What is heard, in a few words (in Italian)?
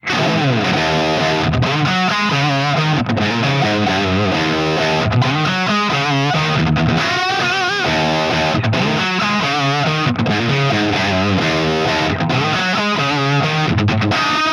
HB Les Paul Bridge Pickup